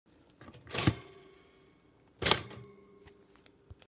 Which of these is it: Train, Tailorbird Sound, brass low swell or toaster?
toaster